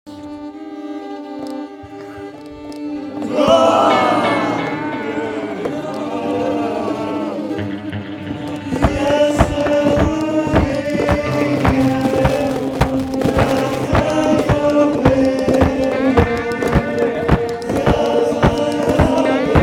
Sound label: whaaah